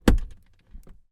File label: household cupboard hatch door open from inside